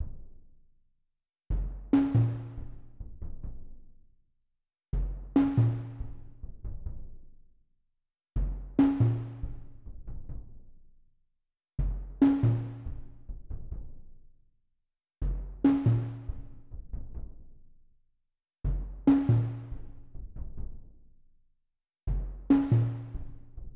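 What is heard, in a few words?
70 BPM A# Minor Synth Percs, RnB; Melodies; Desires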